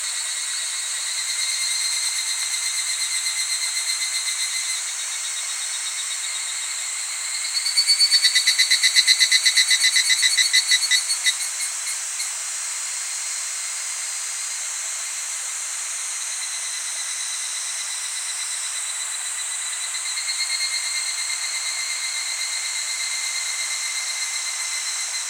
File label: Tanna japonensis